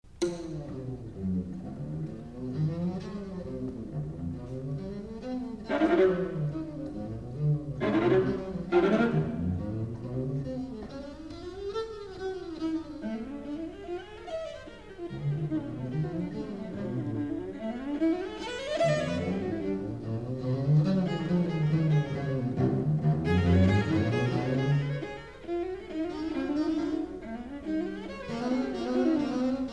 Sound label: Seven Etudes, string chamber; a due 1973